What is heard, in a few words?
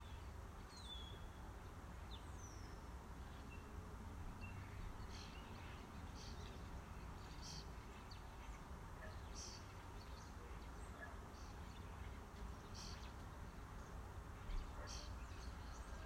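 Starlings squabbling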